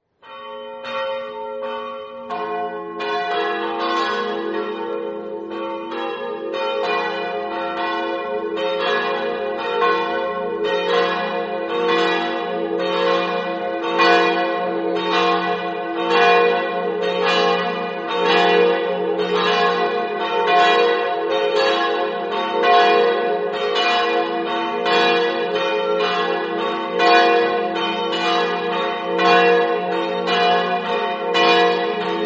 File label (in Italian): orgnano, campane